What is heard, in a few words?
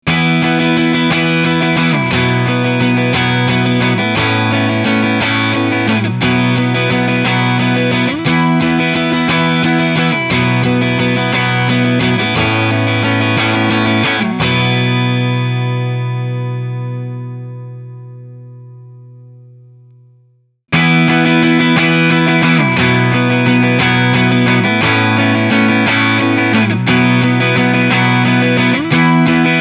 rackmode graphic eq indie